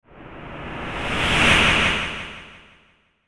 Whoosh Crescendo Windy Delay, Crystal Whoosh Rear To Front To Rear; Blastwave; Whoosh Sound Effects